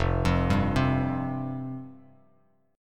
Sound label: F Suspended 2nd F Bass Standard; Fsus2 arpeggiated; JGuitar